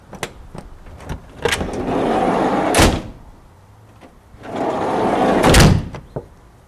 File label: Sliding Door